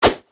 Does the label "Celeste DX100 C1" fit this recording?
No